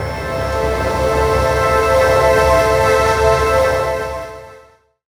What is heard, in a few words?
Atmospheric, Pads; Jungle; Jungle Warfare 1&2